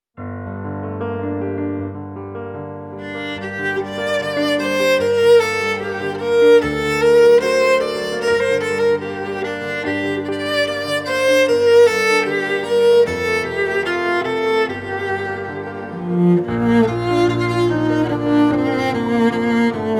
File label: moderato